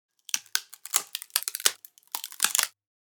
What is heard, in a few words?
Cracking wood